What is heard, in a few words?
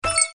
open; blind Box